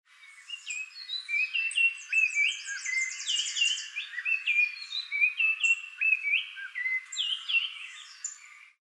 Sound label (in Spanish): Icterus chrysater Turpial Dorsidorado